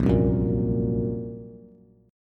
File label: Bb Suspended 2nd Sharp 5th Bb Bass; strummed; chord shape